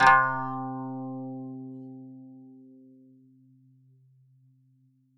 KANUN C3; SoundBytes; Early Patches; KAN CHOR BIG

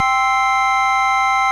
ORG VOX C, Continental

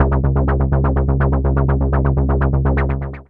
SYSTEMAT C#3, Analog Rhythm; E-MU Producer Series Vol. 6 – Analogia Project (CD 1)

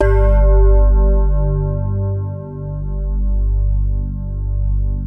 crystal pad; Sound Gallery - Charged

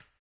HAMBONE; ILIO; Vocal Planet VOL-2; Blues & Soul

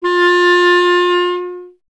clarinet F; Miroslav Vitous; Solo Instruments VOL-1

